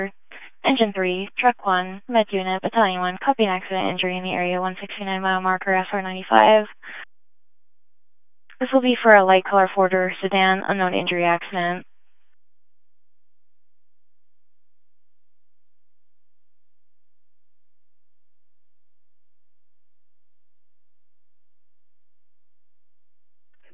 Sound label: Multi-Tone Alert; Havasu Scanner Feed, LLC.